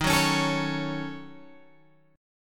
D sharp Minor 7th Flat 5th D sharp down Guitar Open G